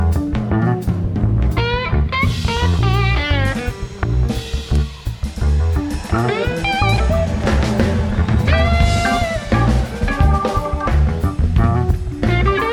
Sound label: jazz